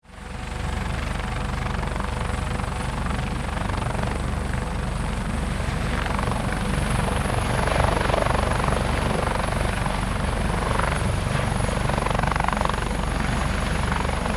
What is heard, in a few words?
Helicopter Take Off, Aircraft, Helicopter, Take, Off, Hh-65, Dolphin; Transportation; Helicopter Sound Effects; BlastWave